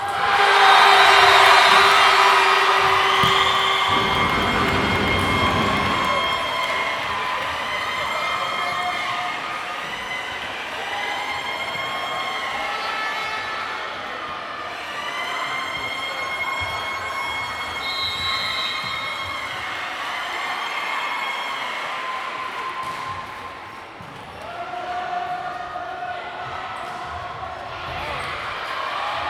Crowd Sports game; Stefgaz

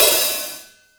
HiHat OpenSabian; The Black Pearl V1

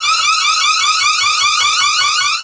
Alien Alarm

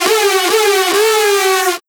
Trap Siren

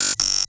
Error Glitch

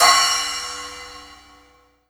Crash Splash; pitchglitch

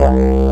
Didgeridoo; qubodup; pitchglitch

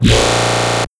Growl Hit; Exsomniel

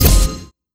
Sound FX Scifi Drum Kick

